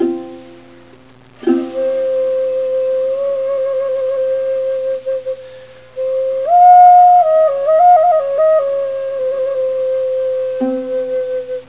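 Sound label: flute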